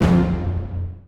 Combo Win Hit; Bee Movie Game; Duel

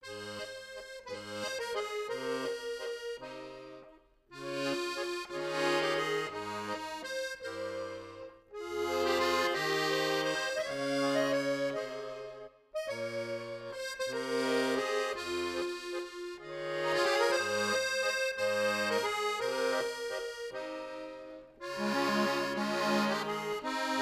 Accordion; legend of zelda the breath of the wild the champions ballad

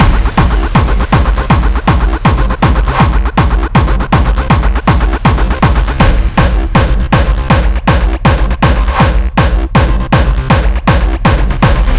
Beats Loop; Anger Foot

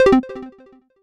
Menu Accept; pac man kart rally android

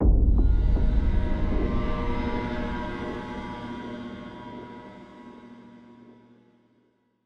Stingers sting pain

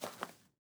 footsteps GRASS

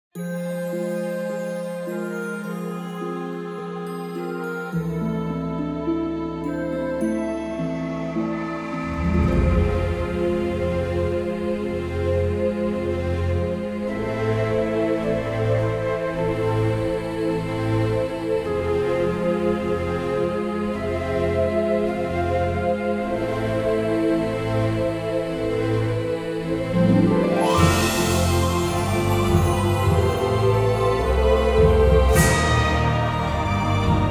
Elves Amb; Disciples III Reincarnation

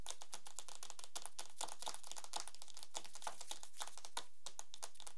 spider buddy loop

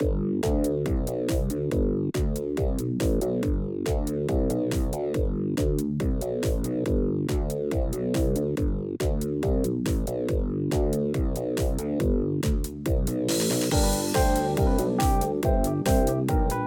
Running Jump BGM; crossfire online

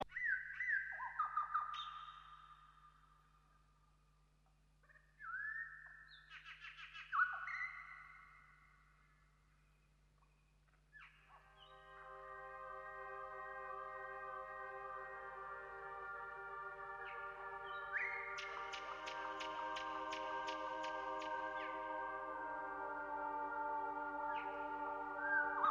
Vocals; PixelJunk Shooter; PS3

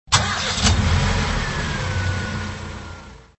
Car Start; Extra Lives

